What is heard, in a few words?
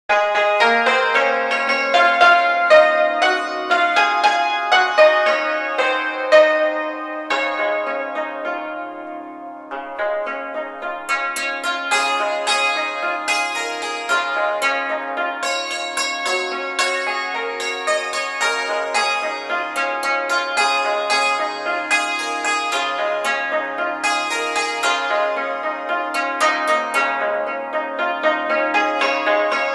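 hymns and medleys on chinese hammered dulcimer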